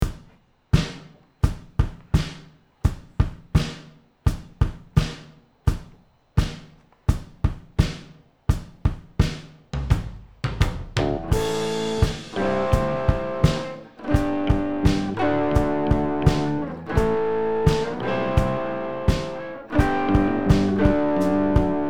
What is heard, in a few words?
Jam Backing Track